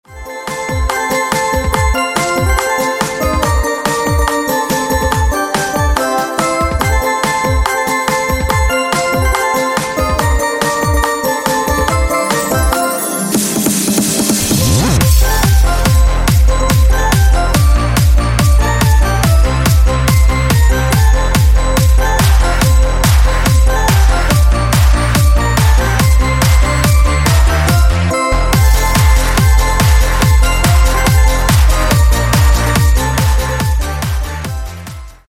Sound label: dance jingle bells dance radio mix